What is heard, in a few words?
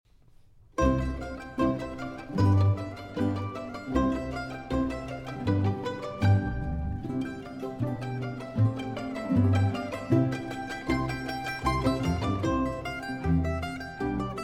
Mandolin Concerto in C Major, Allegro; III. Allegro